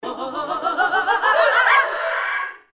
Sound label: ahh up